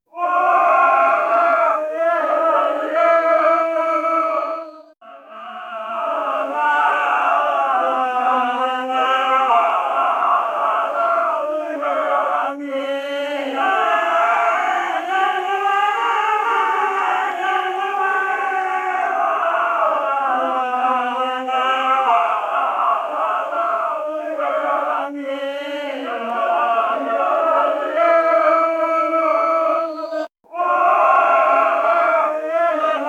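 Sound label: a million goblins chanting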